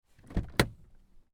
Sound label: car door open internal; spoteffects